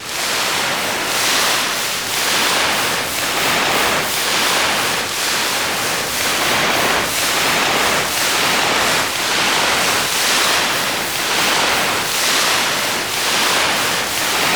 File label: sea shore